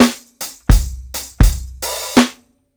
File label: 82 DRUNK FILL, DRUMS LOOPS; BASEMENT DRUMS VOL 2